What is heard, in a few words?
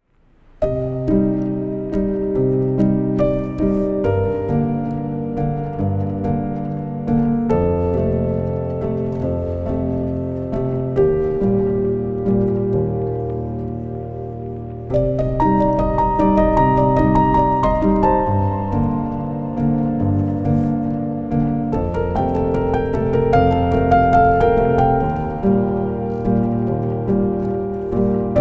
sad piano